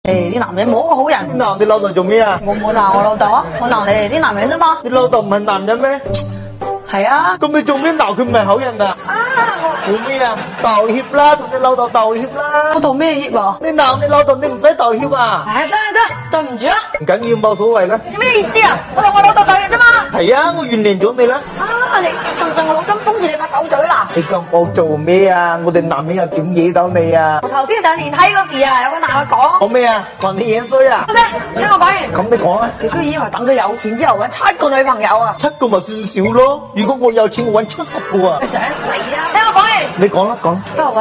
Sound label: laugh; breadradio